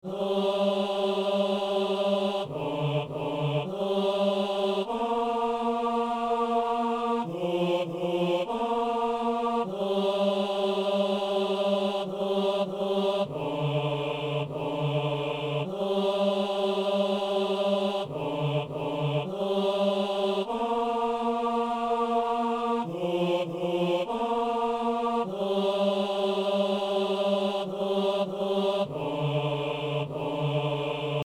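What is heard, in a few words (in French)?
anamnèse basse; messe de san lorenzo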